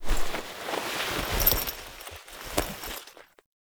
Stand To Kneel; Tau; Somatic Bearer

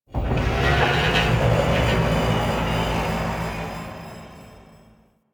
Ship Energy Rumble; Ambience; Warframe; Corpus Ship Remaster

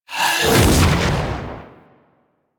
Purgatory Ghost Possess; Warframe; Abilities

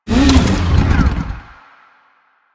Dismount Moving, Motorcycle; Motorcycle Ninety Nine

